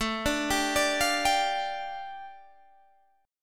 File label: A 7th Suspended 4th Sharp 5th A, Guitar Open D; chord